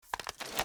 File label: ak47 reload empty up; Tacz1.1.5+; RSWS 1903